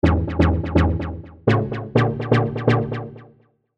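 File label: synth, old skool synth; Restless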